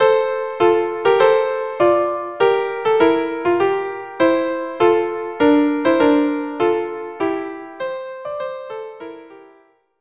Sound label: violin duo; La Doucer